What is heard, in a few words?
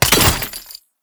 Ammo Bounce; Ammo Ability; Warframe; Warframes; Frumentarius